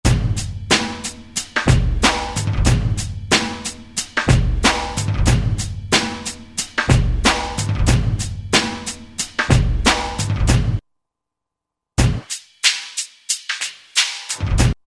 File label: 92 Street Rok; Roland SR-JV80-12 Hip Hop Collection